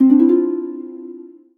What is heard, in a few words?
Solo Harp Positive; rising islands windows gamerip 2016